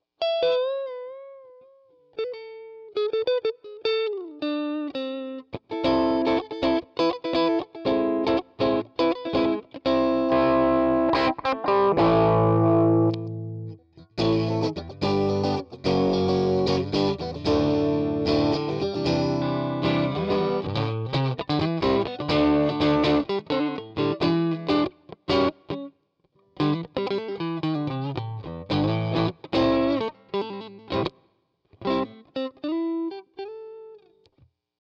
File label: fender vibro vanilla